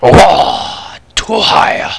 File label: fall; vortex; shogun